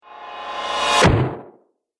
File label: Trailer Thud Riser; Sonic Logo Sound Effects